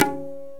INDIAN PERC

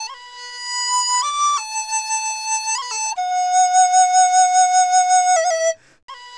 DIZU 80 D; INIS - Opium

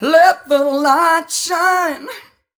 m 128 mel b-flat, Vocals

